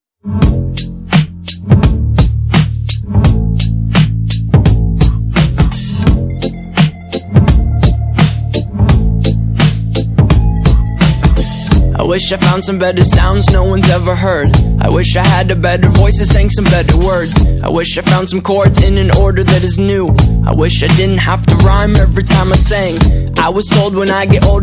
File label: htc tone ringtone; mobcup